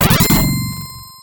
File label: Coin; Raiden